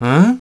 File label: humm; BattleSNDS